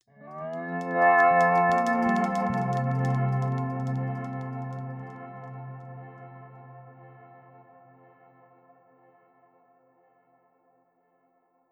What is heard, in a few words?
Musical Cue; Ittle Dew 2